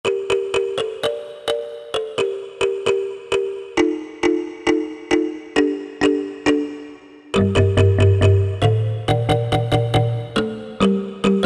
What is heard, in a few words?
Kalimba, Korg M1; Factory preset examples